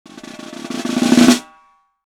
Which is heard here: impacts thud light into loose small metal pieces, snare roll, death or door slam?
snare roll